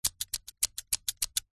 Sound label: office scissors snips close up; zapsplat